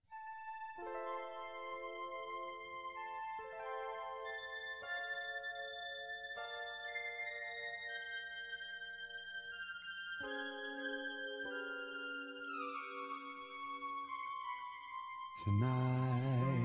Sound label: fill